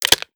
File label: taurus cylinder open; daffas arsenal; tacz